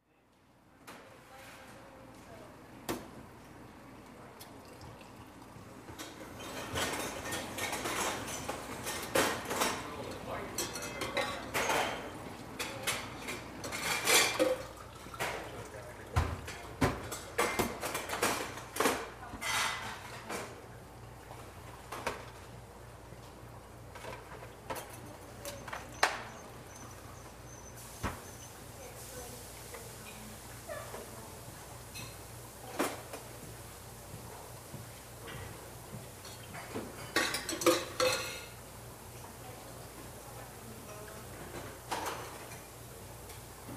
Coffee Shop Ambience, W Dishes And Cookware Activity, Grill Sizzling, Voices In Background.; restaurants